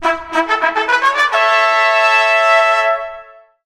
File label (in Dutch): trompet; efteling; joris en de draak